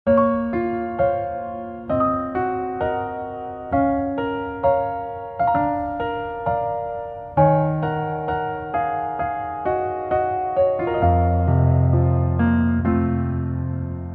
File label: Piano